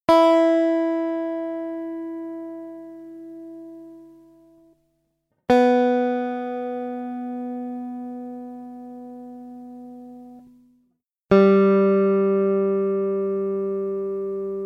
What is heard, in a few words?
Rock Tuning, rock guitar; RGT